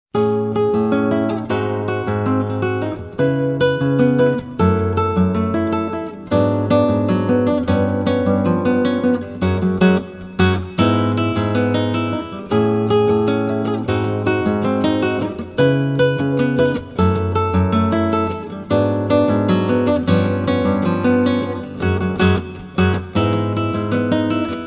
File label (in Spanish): Brisa Flamenca